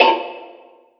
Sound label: Wah Oneshot; Sonic Foundry (Sony Creative Software); Esoterik Beatz